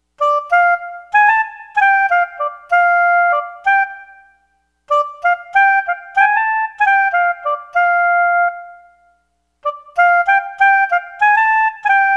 smjazz sax melody

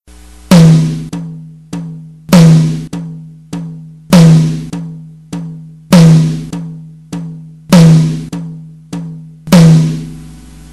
rhythm beats in 3 pattern

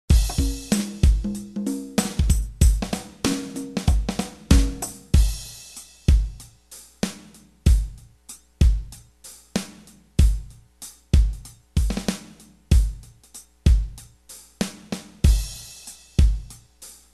jazz drums

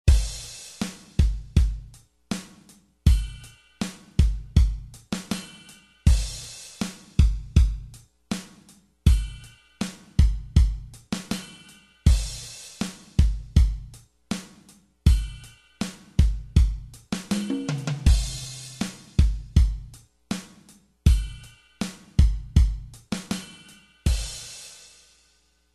drumset composite simple

